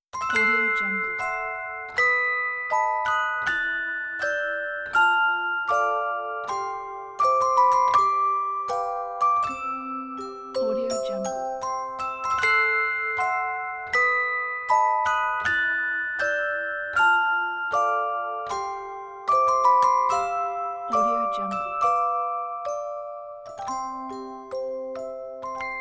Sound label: wedding march music box